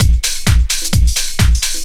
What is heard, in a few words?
dr t 130 trance 2; LOOP TRANCE; DRUMLOOPS